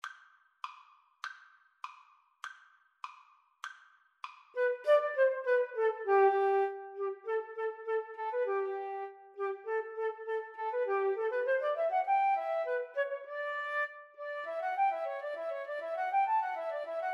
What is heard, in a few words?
Violin Flute Duet